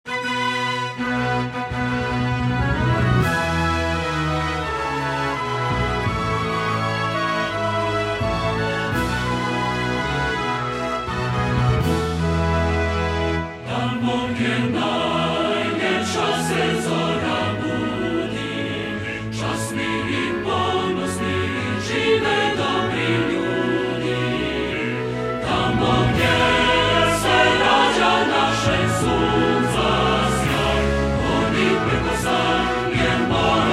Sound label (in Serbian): хор и оркестар; скраћена верзија